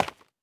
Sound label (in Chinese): 凝灰岩踩踏声